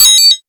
hi-percussion, techno; Techno ID; single-drums

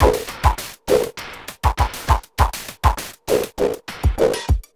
Beat, Industrial

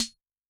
Ana Metal, Yamaha DTXpress-II snare

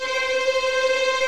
TREM VIOLINS

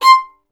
STR VLN, Solo Instruments; Vln Misc; Miroslav Vitous

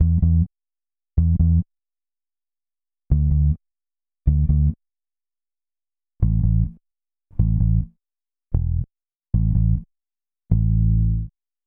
Bass Ending, Indie Rock; Sonic Foundry (Sony Creative Software); Indie Rock Anthems; Session 12 - Spaced Out Knoll

